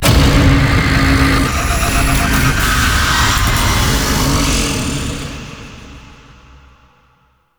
Survival Hack Completed; Entrati

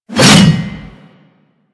Axe Swing; Warframe; Weapons

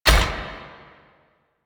Melee Step; Warframe; Necramech

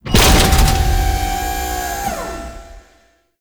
Galleon Platform Start; Warframe; Grineer Galleon; Vor Galleon Fight

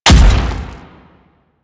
Thumper Bot Step Heavy D; Warframe; Grineer

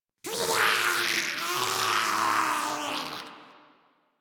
Arm Pain; en; Warframe; Grineer; Ghoul

